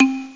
tropical mallet; The Epic Collection 3; Epic Marketing; muzak samples

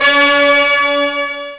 trum; StoneTowers

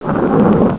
short roar; GeoSafari Geogra; Geogrphy

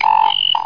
Phone But; 11,127 sample rate; 0.08 seconds

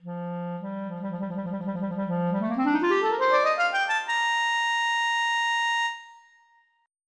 Clarinet; REED

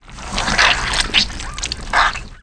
gore

